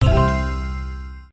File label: count up end, slot; slot game; Flying Dutchman